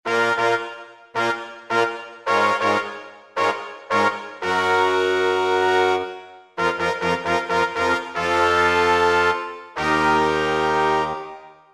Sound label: Dyn Hi Brass; Kurzweil K2000 Orchestral ROM